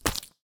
slime splat

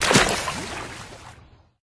water take

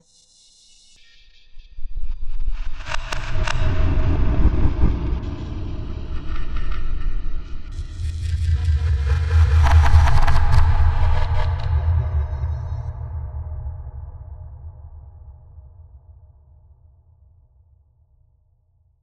scary noise

